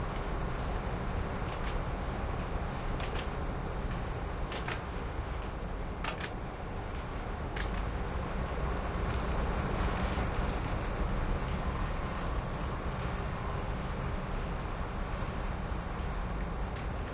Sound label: station; ruina